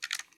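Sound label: gear rattle weapon small; viper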